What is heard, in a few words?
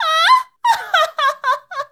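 woman laugh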